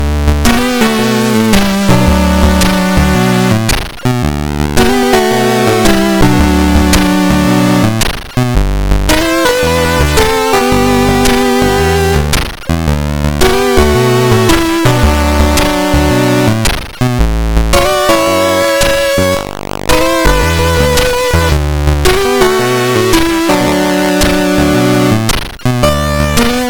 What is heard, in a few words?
Synth, Demos, SIDVoice